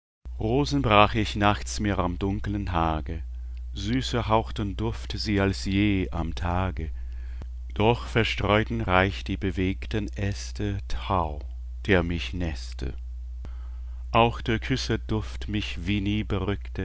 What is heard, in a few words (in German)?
Sapphische Ode; J. S. Bach